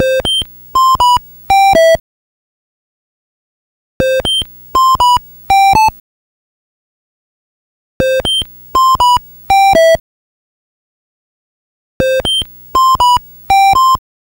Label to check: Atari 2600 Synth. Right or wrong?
Right